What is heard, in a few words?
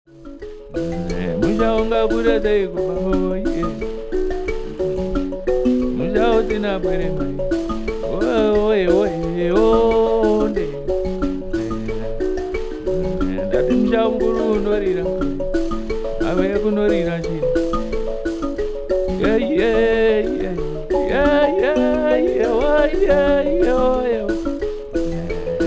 mbira